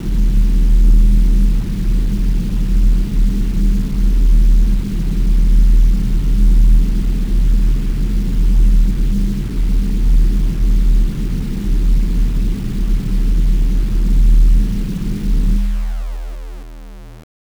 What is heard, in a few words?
buzz; The Swapper